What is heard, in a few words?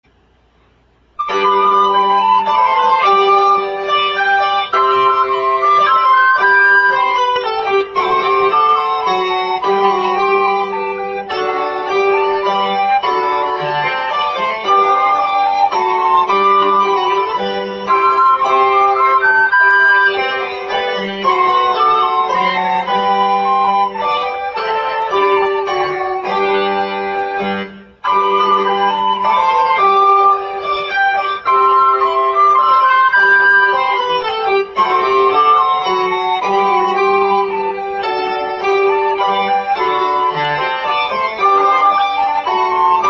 Menuet Cembalo